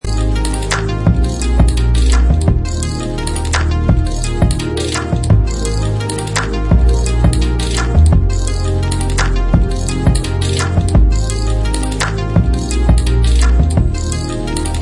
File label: japanese electronics (instramental moog remix)